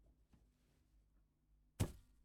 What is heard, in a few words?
Droping Something Heavy On Grass